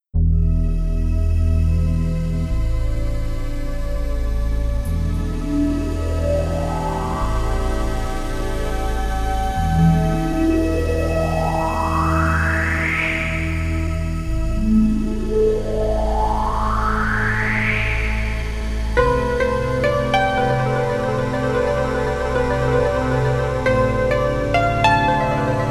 orientalny masaż peaceful garden 432 hz

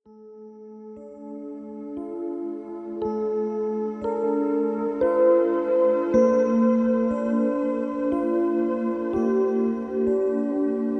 akwarium 432 hz harmonia i odprężenie

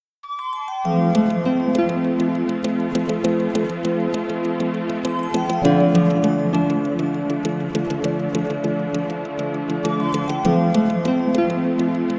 taneczny relaks 432 hz

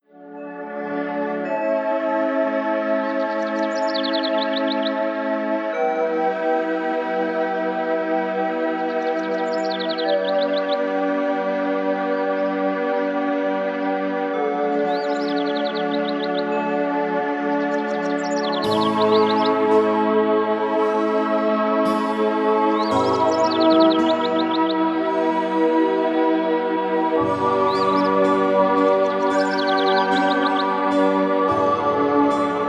muzyczny miks relaksacyjny 432 Hz

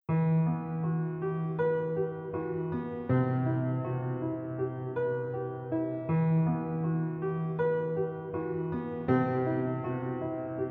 pozytywka 432 hz, Żywe fortepianowe i smyczkowe kompozycje; plomien i deszcz

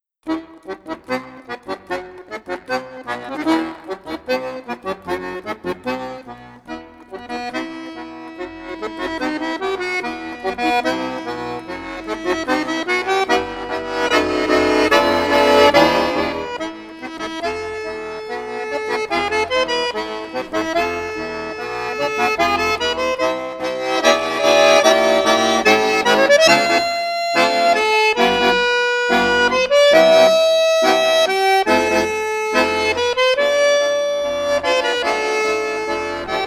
duet akordeonowy tango 432 hz

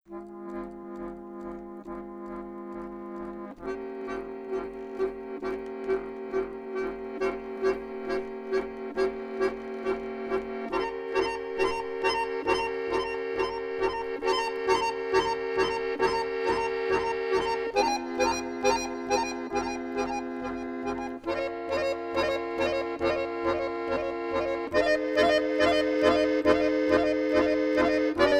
duet akordeonowy 432 hz; vivaldi winter

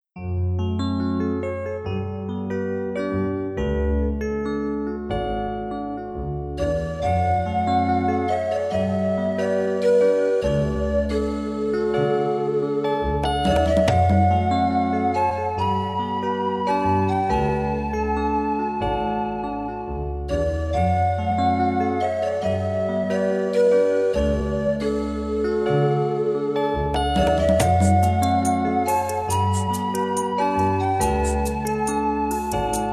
muzyka do masażu 432 Hz; masaz ciala

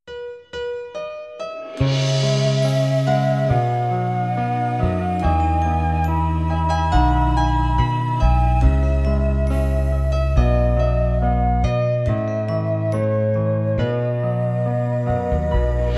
taneczny relaks 432 hz

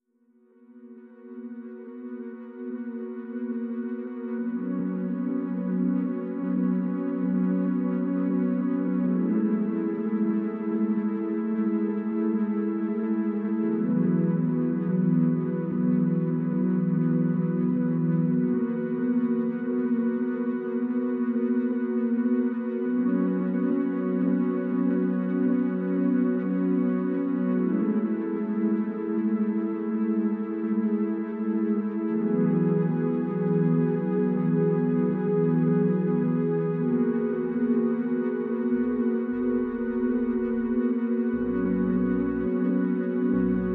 magiczna muzyka, 432 Hz

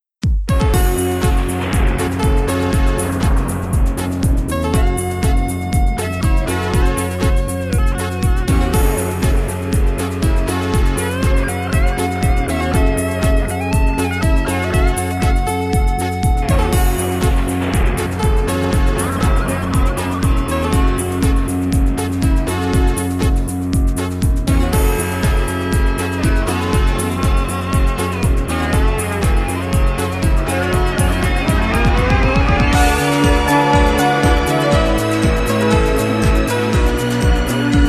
taneczny relaks 432 hz

